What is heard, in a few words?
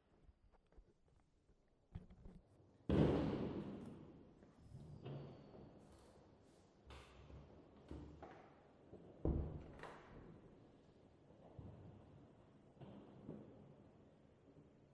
fireworks; fieldrecs